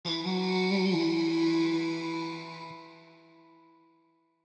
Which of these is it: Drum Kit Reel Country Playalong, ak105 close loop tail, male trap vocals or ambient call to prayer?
male trap vocals